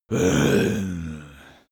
zombie moan idle heavy

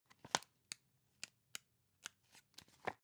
magazine browse

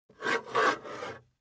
metal scrape on wood

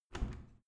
washing machine modern open fast

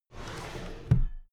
drawer cabinet paper close regular halfway

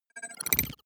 data transfer pluck

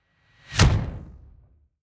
incoming metal spike

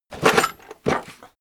tool draw hard

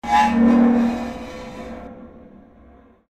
metallic scream

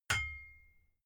hammer hit ring high

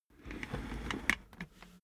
office chair worn trundle soft

